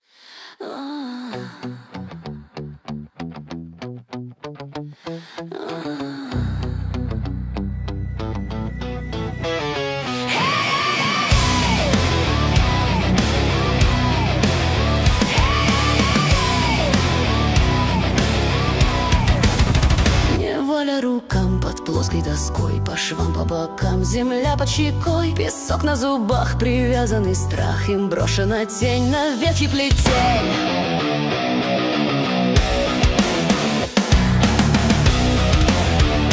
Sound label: Reggae